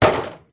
pub plastic crate empty bottles place on wood surface; field reports